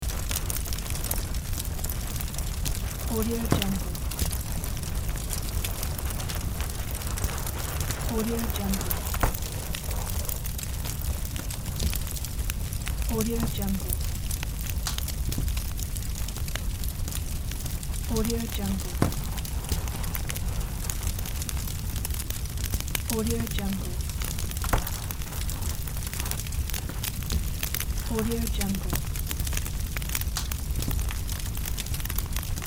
fire; fresh-sounds

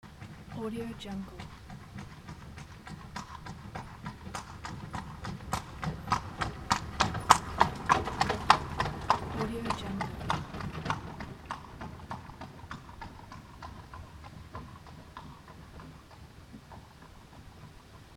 horse passing by

